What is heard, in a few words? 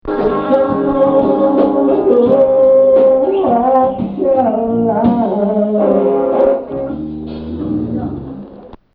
soundcheck; dentist